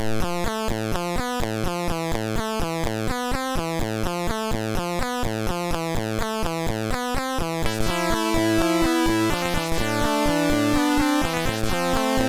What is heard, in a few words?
tune, sid MOS6581R2; Great Kloakkman